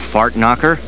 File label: pain; butthead